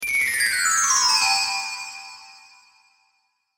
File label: Chimes High To Low With Reverb, Windchime